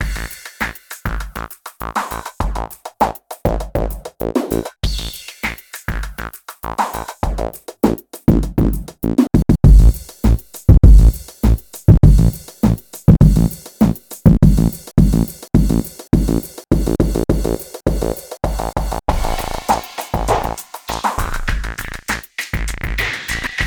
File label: e-mu esi-2000 filter